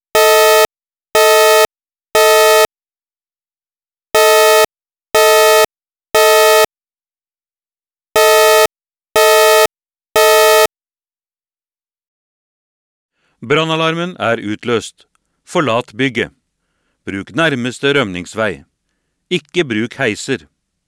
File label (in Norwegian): 3x alarmtone Evak med heis; talevarsling